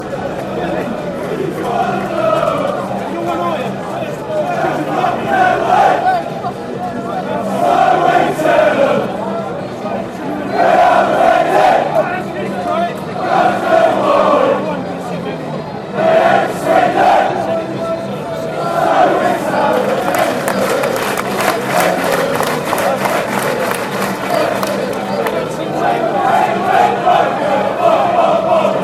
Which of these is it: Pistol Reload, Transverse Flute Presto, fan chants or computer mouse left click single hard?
fan chants